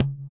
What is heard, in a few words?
THROAT; Spectrasonics; Metamorphosis; THROATERS